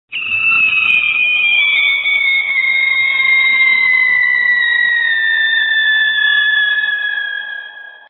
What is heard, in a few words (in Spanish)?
Bomba cayendo